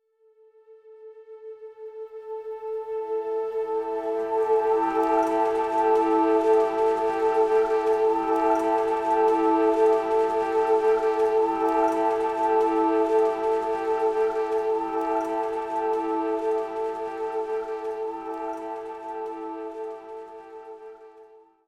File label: Desert Leave Canyon Loop